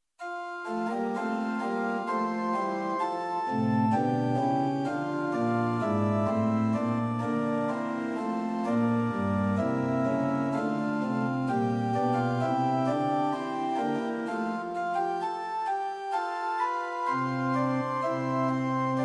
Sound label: Organ Books, Temperament Equal; Prinz 8 4; hymn